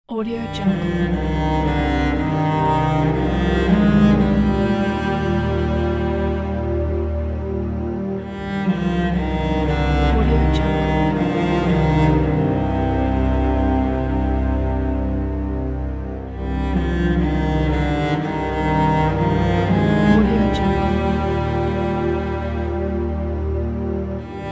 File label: cinematic sad cello